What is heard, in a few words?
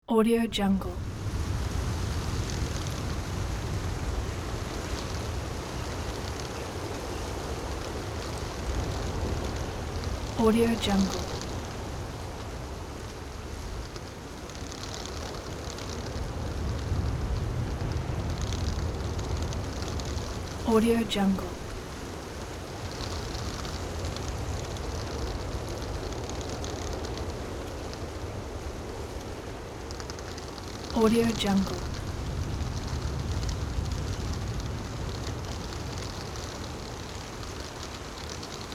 wind in grass